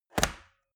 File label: metal box small close hard; maschinen; soundpool